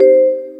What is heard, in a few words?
Reel stop; MexicanaChillitime; ClubFusion